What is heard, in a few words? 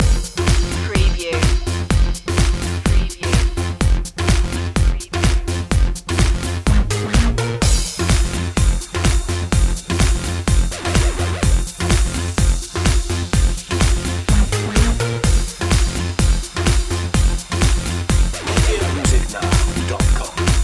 sexy step loop